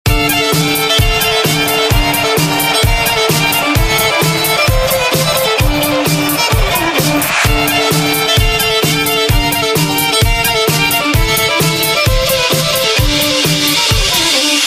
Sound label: fairytale violin, electronic ringtones